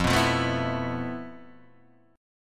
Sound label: F Major 7th Flat 5th, F, down, Guitar, Drop D